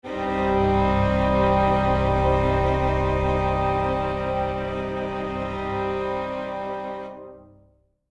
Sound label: Sad Stinger